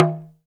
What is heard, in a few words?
TALK DRM, Heart of Africa; Spectrasonics